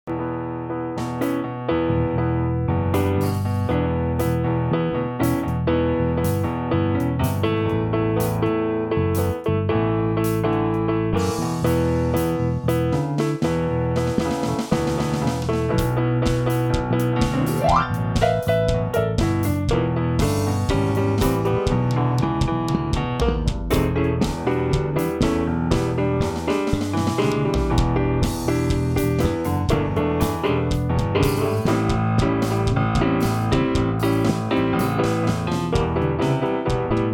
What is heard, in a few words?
blues